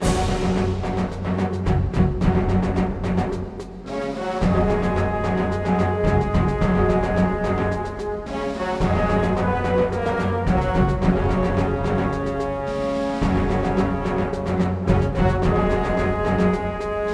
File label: victory long music; mechwarrior 4 veng